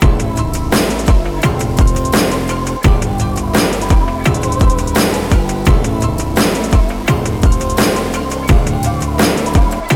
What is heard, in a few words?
Modern Pop Hits; Big Fish Audio; Pop Life